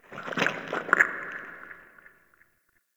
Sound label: Interior Medium, Ambience; Sentient Lair Digest; Warframe